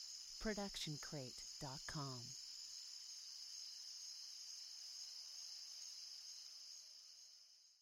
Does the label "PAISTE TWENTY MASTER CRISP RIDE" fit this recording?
No